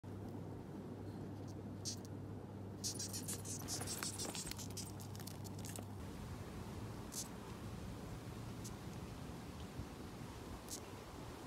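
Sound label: Moth, insect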